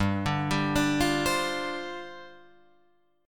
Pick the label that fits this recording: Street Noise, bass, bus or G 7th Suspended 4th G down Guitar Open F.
G 7th Suspended 4th G down Guitar Open F